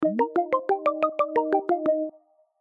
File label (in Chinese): 叮铃; Teams; Classworks